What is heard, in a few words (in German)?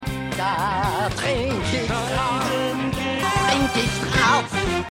Trinkerin Gesang; Schmigadoon